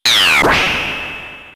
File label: beam; geno; SNES; SMRPG